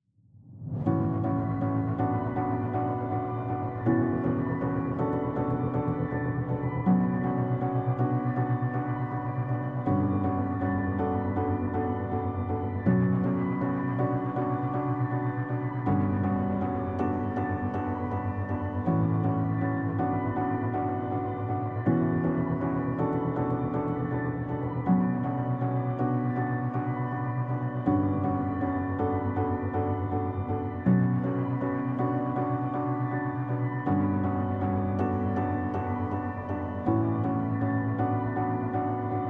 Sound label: Forest Music, Fight Section Transition